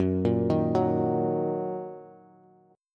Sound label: Gb 6th Gb Bass; arpeggiated